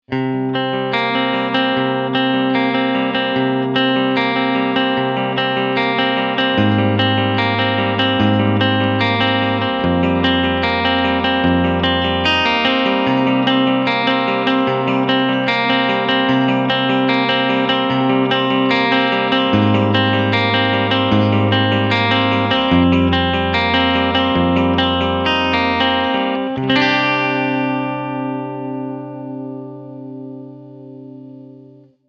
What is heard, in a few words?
Clean Arpeggios, guitars